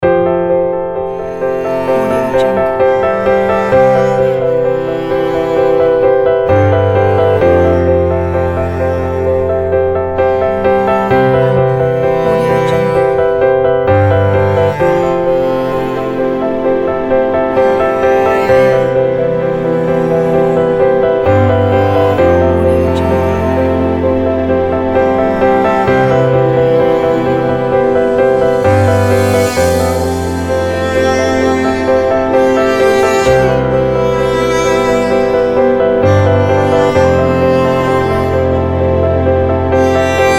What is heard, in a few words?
documentary cello